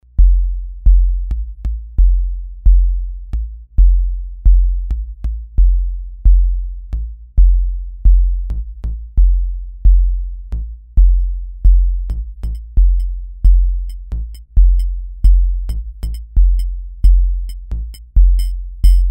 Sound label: cgs drums; cgs synth